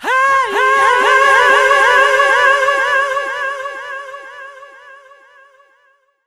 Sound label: HEE HII HEEE, ADLIB MEN, Funky Vocals; USB Soundscan vol.18; AKAI